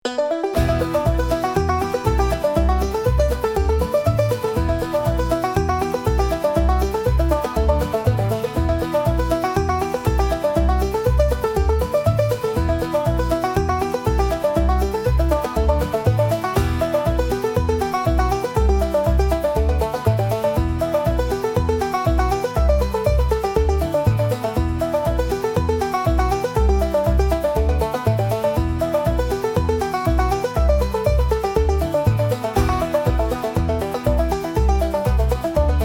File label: banjo picking solo with acoustic guitar accompaniment